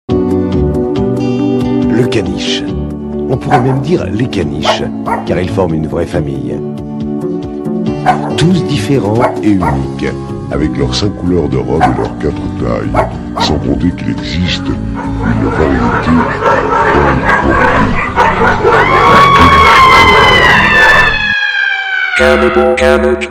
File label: jingle; tarzan premier